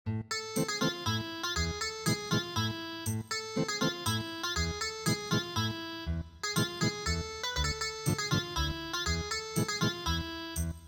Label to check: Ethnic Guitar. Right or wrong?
Right